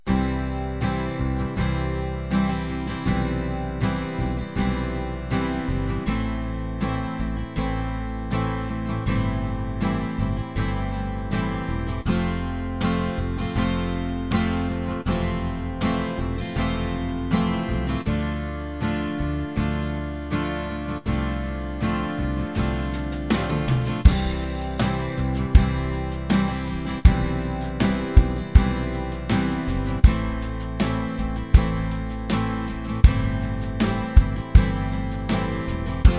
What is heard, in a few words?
chords